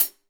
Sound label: OHH, Real Drum Kit, SWING KIT; Best Service ProSamples vol.43 - Real Drum Kits